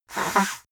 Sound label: nose blow dry; koerpergeraeusche; menschen; soundpool